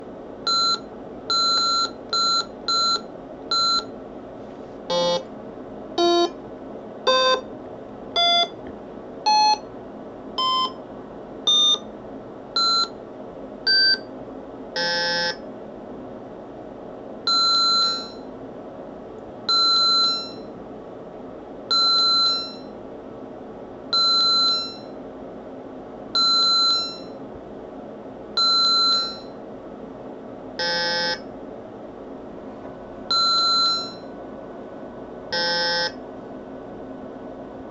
sound game; Handhelds; LED and Sound Only Games; RS Sound Effects Chassis Game

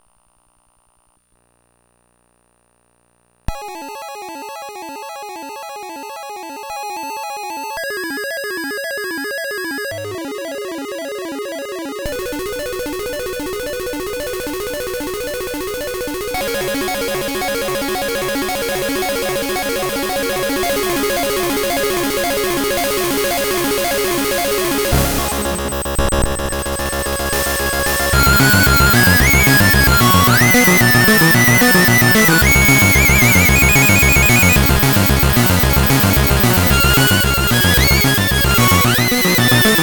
Real GB rev A CPU

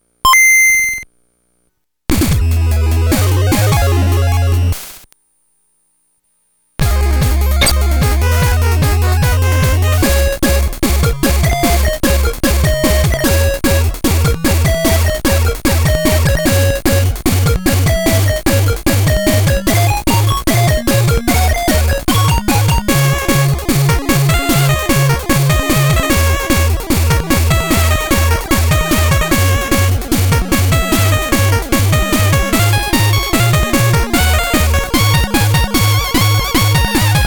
Real GB rev A CPU, gameboy sound samples; Inc Crash Dummies

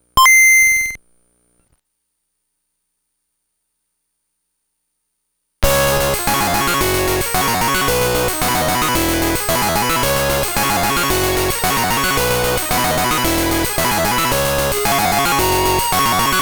Real GB rev A CPU, gameboy sound; Spot intro

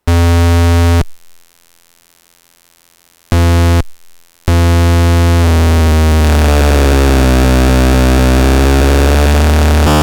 FPGA GB noise wave; gameboy sound samples

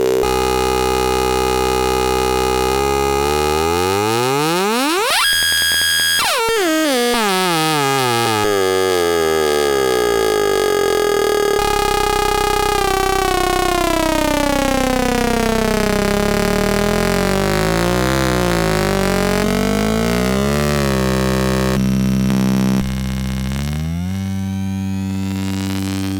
FPGA GB rez; gameboy sound samples

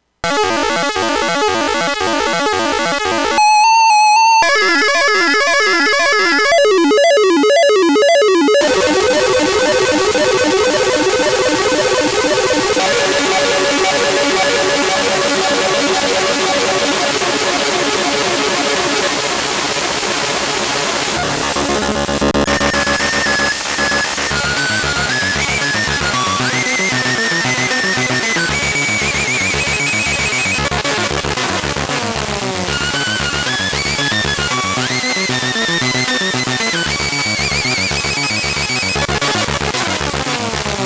fpga gb broken fir filter; gameboy sound samples

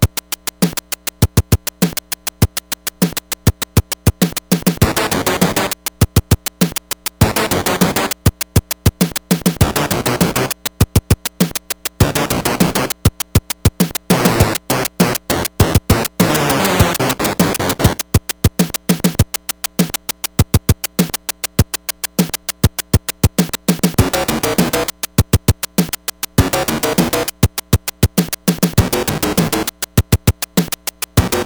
tia smb3 4 distortions; nes tia

